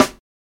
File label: Acoustic Snare C# Key, Royality free acoustic snare tuned to the C# note. Loudest frequency: 1652Hz; snare drum samples